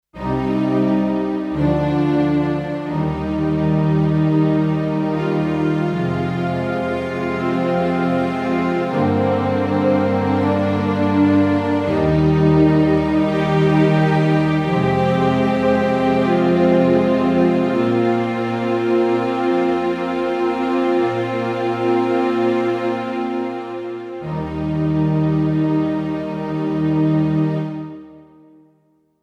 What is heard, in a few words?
Yamaha PSR SX600 Concert Strings